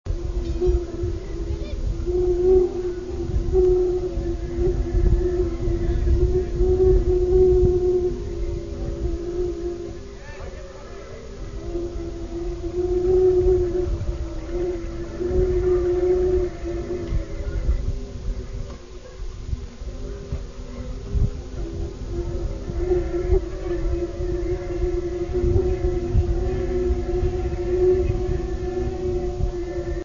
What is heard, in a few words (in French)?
bambou